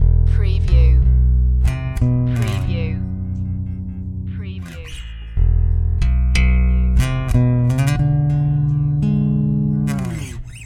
Hip Hop Bass Guitar Loops 90 BPM - E

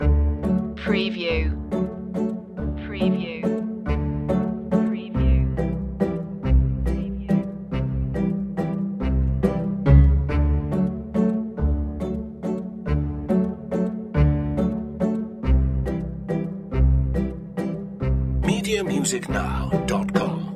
waltz

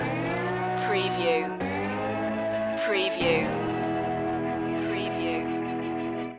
bikers blues loop

